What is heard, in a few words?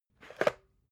water boiler pick up hard